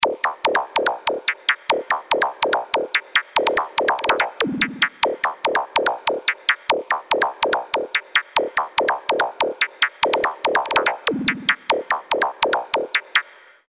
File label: Tic Zap Toe, Zaps; Industrial